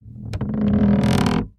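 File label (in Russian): скрип одиночный короткий низкий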